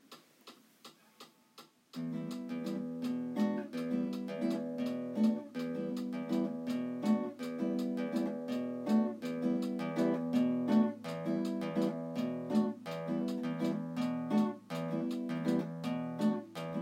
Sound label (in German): mit Dynamik 160bpm